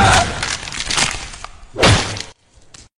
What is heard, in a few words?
kill sound; scp-096